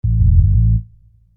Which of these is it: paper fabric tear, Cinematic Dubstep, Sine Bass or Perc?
Sine Bass